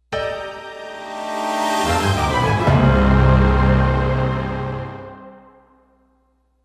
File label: Quest Failed; one piece unlimited world red